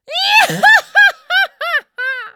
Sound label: Woman Laugh Clown